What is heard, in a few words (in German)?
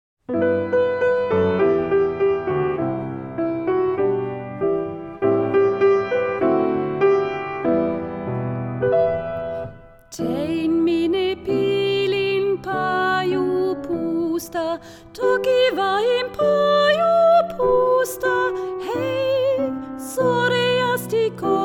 komplett mit Gesang; Tein minae